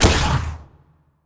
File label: Plasma Shoot